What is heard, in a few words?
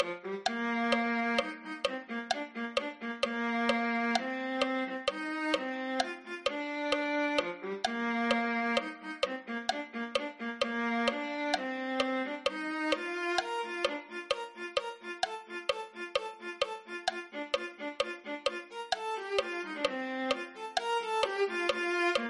viola